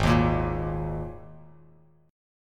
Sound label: Ab Suspended 2nd Bass Standard; strummed; JGuitar